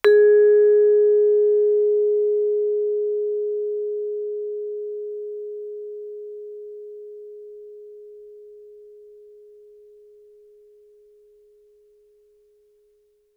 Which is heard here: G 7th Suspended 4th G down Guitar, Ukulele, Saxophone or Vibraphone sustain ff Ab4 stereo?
Vibraphone sustain ff Ab4 stereo